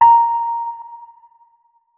notes, keyboard, electric piano

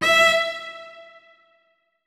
notes, keyboard strings; Hideout Furniture

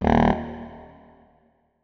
notes, saxophone; Hideout Furniture

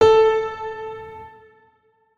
notes, keyboard piano; Hideout Furniture

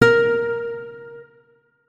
notes, guitar; Hideout Furniture

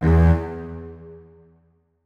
notes, keyboard; Hideout Furniture

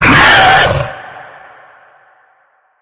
hit, monsters, lurker; Soundscape Overhaul

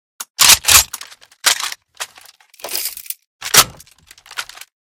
unjam, weapons; RPD Reanimation; librarian rpd

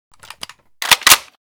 unjam, weapons; WA2000 Reanimation; librarian wa2k

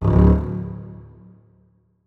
notes, keyboard; Hideout Furniture

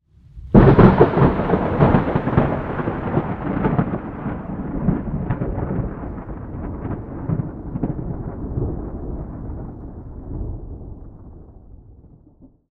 close, nature; Atmospherics

